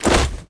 swish weapon swing swipe

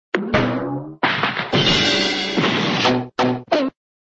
fight; hannah barbera cartoon sound fx; fred and barney

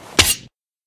knife draw; spy assassin; tf

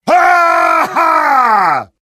big laugh, heavy; taunt; tableflip

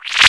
space program error; windows 9x; sound schemes

